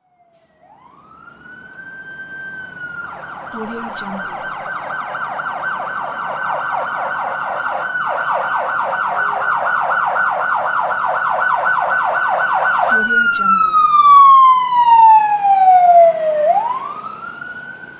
police car passing by

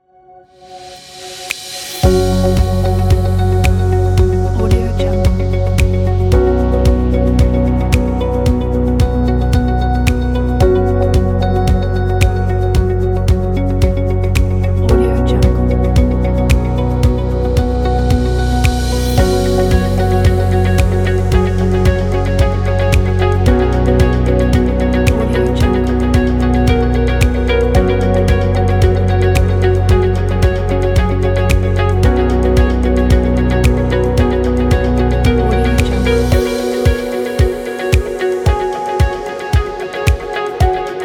energetic corporate tech

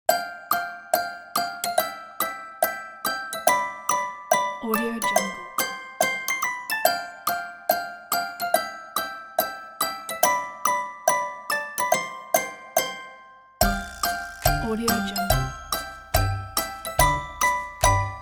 funny cute cuddle bugs

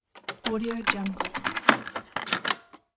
cassette recorder insert tape

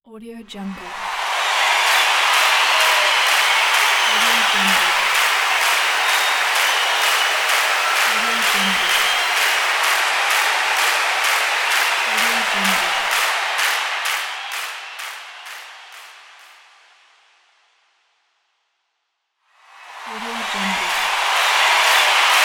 crowd cheering and claps; v-records-moscow

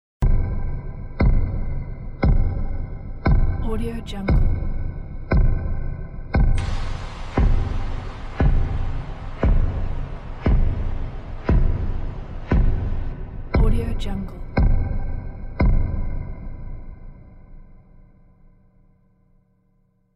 spy dark suspense battle separator

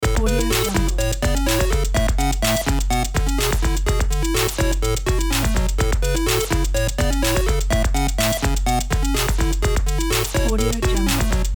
retro breakbeat loop

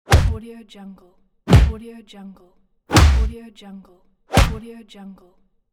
fighting punches

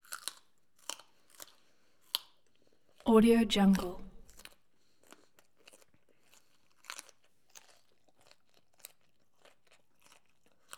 eating baby carrots

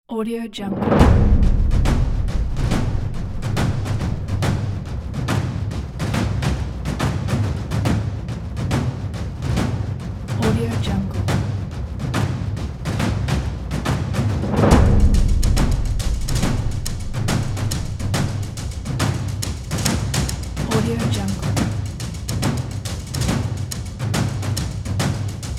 this is drums

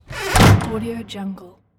a big wood door close

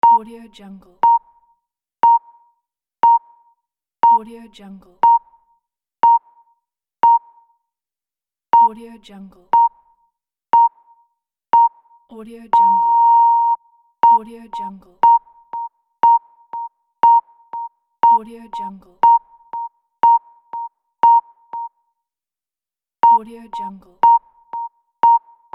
beep

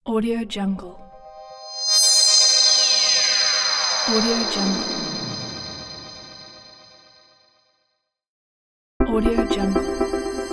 harmonic sweeps

